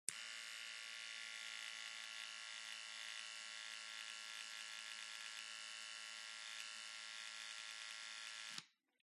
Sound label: household bathroom grooming electric razor on off buzz slow low battery